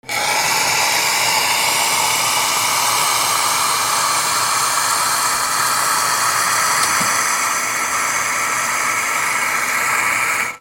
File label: KITCHEN